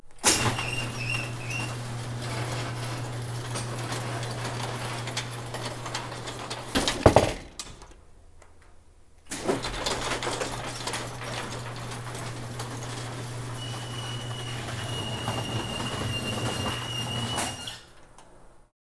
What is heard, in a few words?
Large Garage Door, Industrial